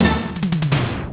level up; Insaniquarium; popcap

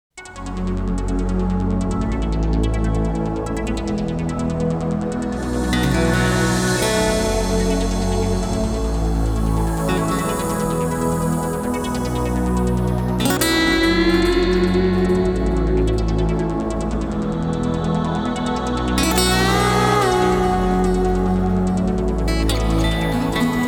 Guitar Melody; Virtual Nature; Vaporwave

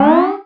speedup; Drunken Robot Pornography; Inago Rage

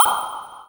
pinata ping; Drunken Robot Pornography; Inago Rage

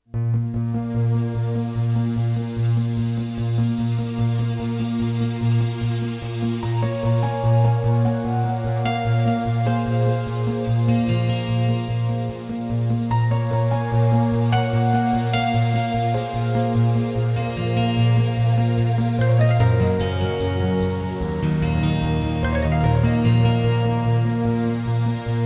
Jungle Dream (Fender Piano Melody); Vaporwave

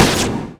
damage enemy; Drunken Robot Pornography; Inago Rage

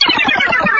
power down; Junkbot

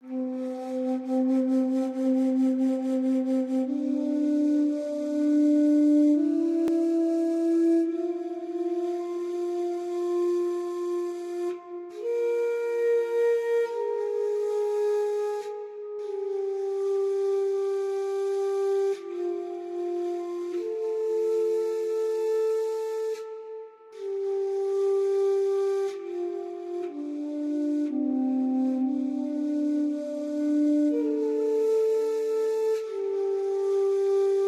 Flute; Onirism Unofficial OST

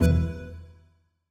Beep; Longhorn 9X Sound Scheme by David Mounder

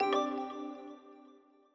Background; Longhorn Ten Alfa Sound Scheme by David Mounder

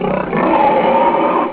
roar; Insaniquarium; popcap

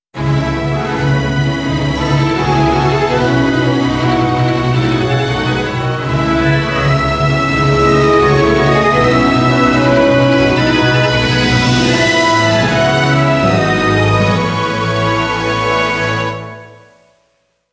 Jingle - Dock Constructed

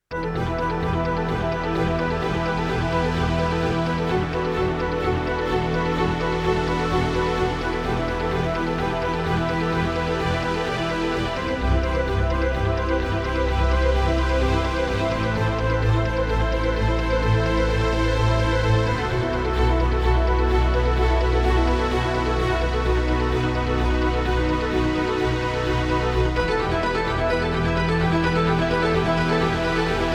Strings Melody; Vaporwave

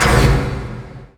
explode; Drunken Robot Pornography; Inago Rage

